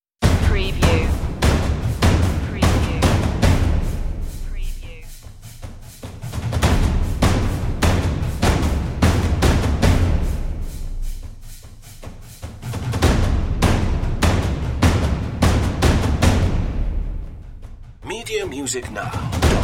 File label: toms of tension less drums